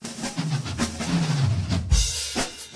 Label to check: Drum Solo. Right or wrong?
Right